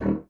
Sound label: D#1, bassoon; Xsample VOL-6; C BASSOON